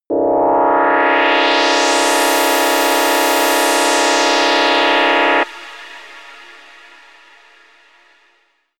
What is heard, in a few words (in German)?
rocket turbine fire power, raumschiff